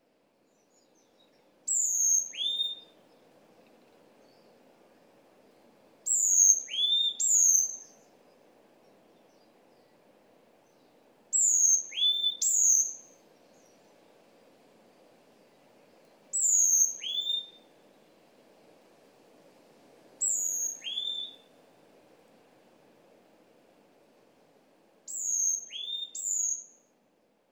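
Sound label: varied tit, miyake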